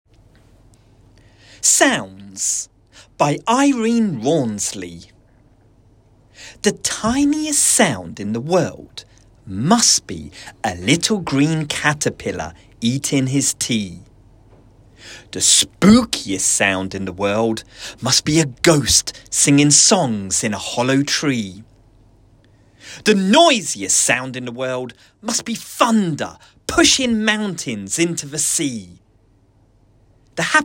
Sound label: Boys Solo Verse Sounds